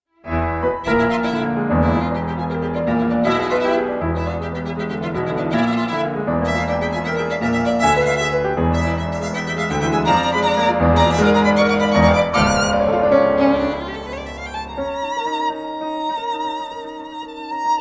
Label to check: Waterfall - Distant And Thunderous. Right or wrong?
Wrong